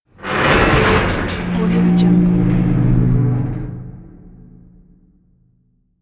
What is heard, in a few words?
metal gate open; sounddivision